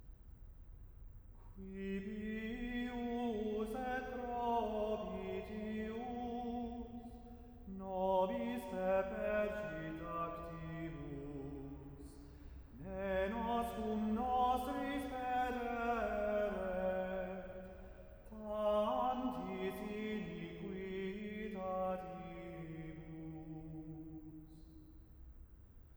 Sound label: Verse 2 Chant